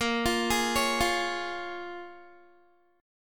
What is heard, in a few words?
Bb Minor 7th, Guitar; Bbm7 arpeggiated